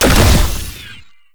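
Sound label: Flying Mouth Projectile Hit; Enemies; Warframe; ManInTheWall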